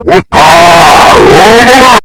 aaaawubadugh loud warning

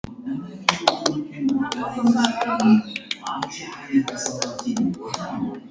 clapping

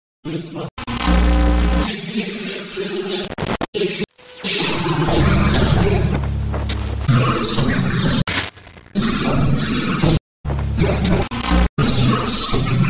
error toilet

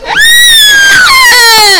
iaaaahh

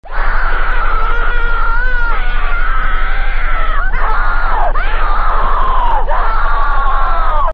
mouse scream; suicide mouse

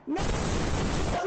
scream

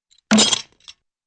small rattly clank

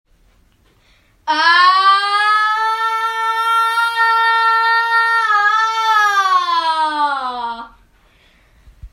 aaaaaaaaaaaaaaaaaaaaaahhhhhhhhhhhhhhhhh

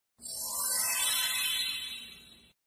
anime sparkle

